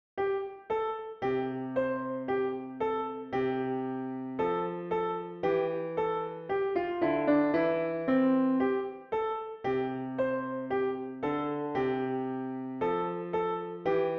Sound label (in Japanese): ピアノ